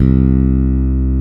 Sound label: PRE-BS C3P, PRECISEBS; Drums In Your FACE!